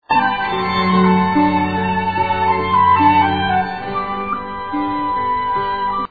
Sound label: flute